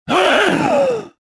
choke; reborn

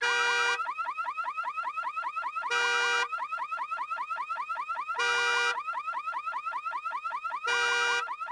Alarm, Ambience; Project Gotham Racing 2

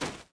thug fs sand, footsteps

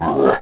roar; The Elder Scrolls III Morrowind GOTY; XBOX

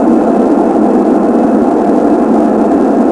Large Crowd Boo; David Beckham Soccer; XBOX

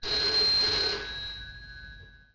phone ring; ambient; Project Gotham Racing

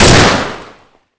pistol shot; Pirates Of The Caribbean; DUEL

